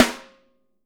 WOOD, Living Drums; Sampleheads; Peter Erskine Living Drums

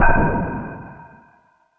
FX Sounds, Drum Machines

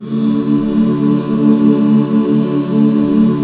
D MAJ, Back Chords; Sound & Vision - Gigapack II CD 1

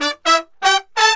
120 AM L, BRASS SAMBA

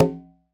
LOW TUMBA, Conga Battery

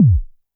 korg trident

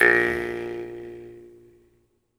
Jaw Harps; E-MU Producer Series Vol. 7 – Old World Instruments

